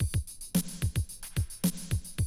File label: 110, Classic Drum Loops